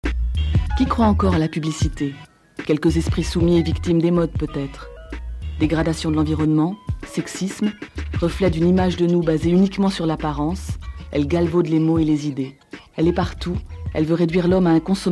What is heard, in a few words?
rap